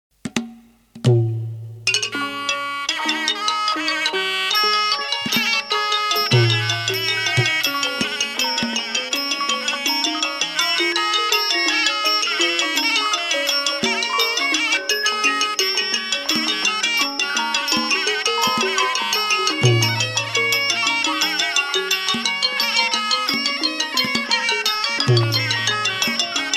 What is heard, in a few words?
Thai Classical Piphat